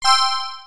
Coin Pickup, ui; aronwk collection; ndaudio

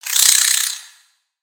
Spider Dazed; Lego; creatures mechanical

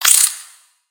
Spider Attack Melee; Lego; creatures mechanical

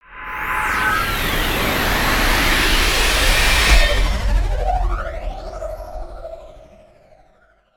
teleport

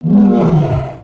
Pet Dragon Groan; creatures pets

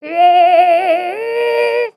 Frog Hat Sing; creatures organic; Ribbit Frog

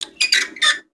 Welder nozzle twist; foley

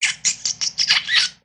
Pet Bunny Ecstatic; creatures pets; Lego

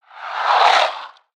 Ice Oneshot; Spinjitsu

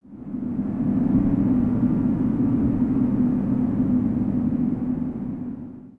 Wind Cave One Shot; ambience; Lego

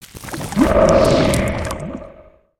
Maelstrom Geyser; spaceship

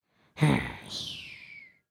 Sleep, creatures organic; Lego; Strombie

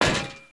Spider Cage Yank; creatures organic

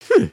bored hmmph; emotes

